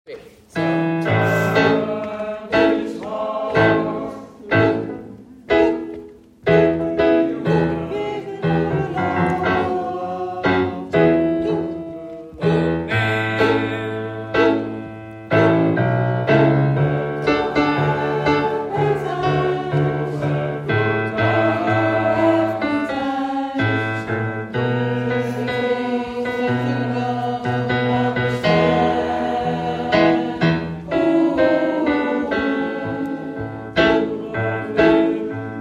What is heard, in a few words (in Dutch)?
Mannen en sopranen